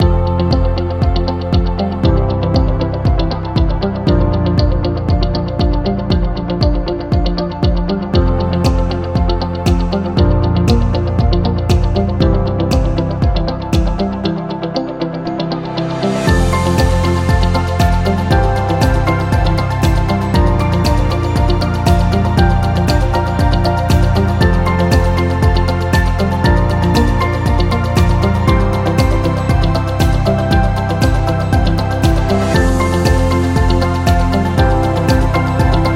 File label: Uplifting Corporate Loop